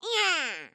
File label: armadillo exclaim